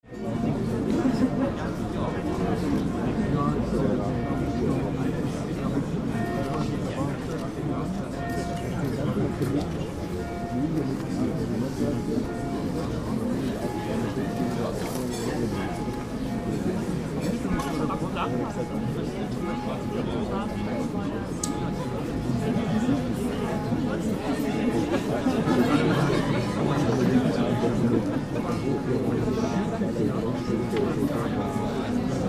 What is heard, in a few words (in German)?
Biergarten Regensburg